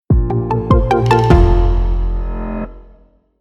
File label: Sound Logo; Hero Long